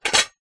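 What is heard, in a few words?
household plate dishes place onto stack of others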